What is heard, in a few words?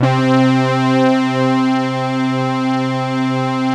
Obie Stack; Optical Media International; Sonic Images Library